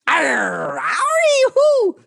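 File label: fist argh oh yeah oo; goldentavern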